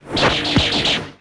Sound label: shaken; 5 elements masters